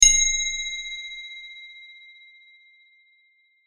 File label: crotale